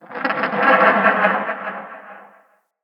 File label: Creature Alerted Call Far, Wildlife; Midrath Island; Ambience